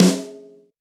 hot snare f sharp key, steel snare drum tuned to the F# note. Loudest frequency: 1400Hz